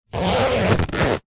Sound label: foley tent bag zipper; zapsplat